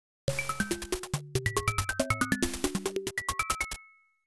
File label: Drive